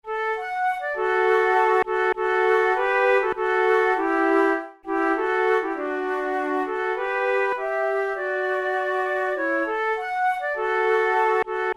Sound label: duet, flute